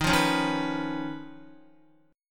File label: Eb 7th Suspended 2nd Sharp 5th Eb, Guitar; x,6,3,6,3,6; Eb7sus2#5 strummed; JGuitar